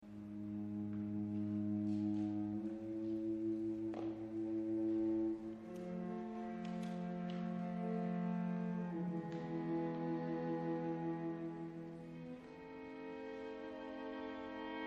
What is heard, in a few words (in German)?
Streichquartett a moll; Beethoven; Op.132